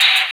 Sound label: Trap Fx; Sonic Foundry (Sony Creative Software); Esoterik Beatz